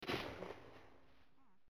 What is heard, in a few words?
gunshot